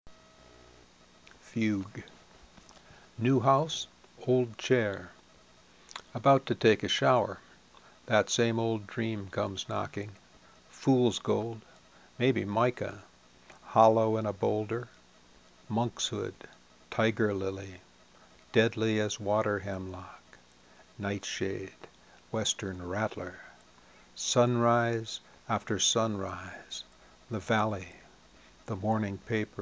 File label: fugue